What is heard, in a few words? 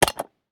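Large Push Butten Switch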